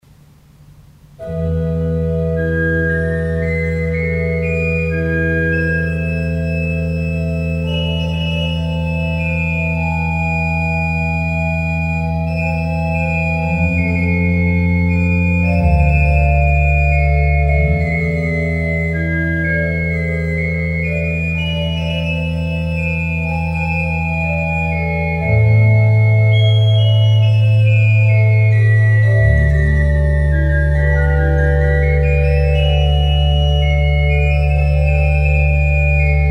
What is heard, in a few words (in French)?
livre d'orgue tierce en bas dessus; livre d'orgue de limoges